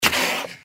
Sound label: gecko scream; npc